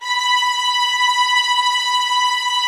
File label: TREM B5, Violin; Q Up Arts; Denny Jaeger Master Violin Library; PERFORMANCE